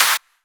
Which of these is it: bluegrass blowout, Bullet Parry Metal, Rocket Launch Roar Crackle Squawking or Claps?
Claps